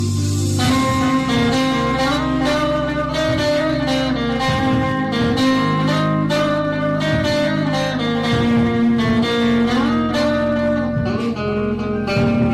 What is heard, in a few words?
guitar instrumental ringtone, bollywood